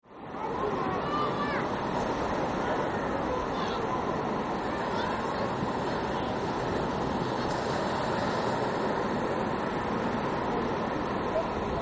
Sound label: Holiday Beach Atmosphere, Quiet seaside beach with distant children; Quiet seaside beach with distant childrens voices - ocean roar